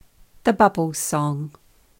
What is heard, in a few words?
The bubbles song; Waiata books